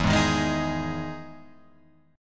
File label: E Major 7th E down Guitar Open F; EM7 strummed; JGuitar